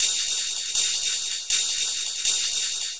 PERCUSSN, Drum Bass, 160; eJay

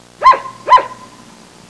poodle barking; pets; Sound Effects for Multimedia - Volume 1

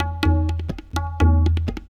PERC LOOP ETHNO 125; eJay

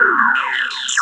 rez beat; Shogo

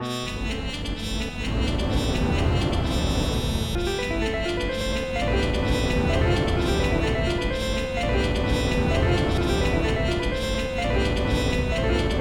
theme

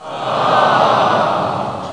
crowd arh, human

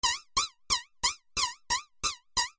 Police

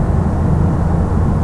Boeing 737-300 wind; X-System 4.65; planes; Heavy Metal

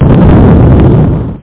Flamer; usnthem

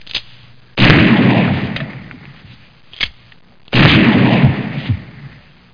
shotgun

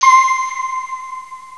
bell; Descent

